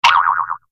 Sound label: incorrect end